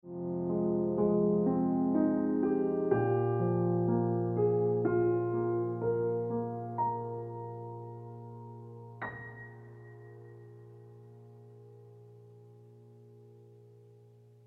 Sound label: Ringtone Piano Music